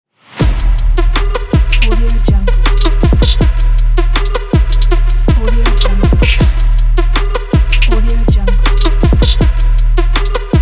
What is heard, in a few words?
logo rap